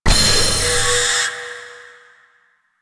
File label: Owl Recon Item Found; Duviri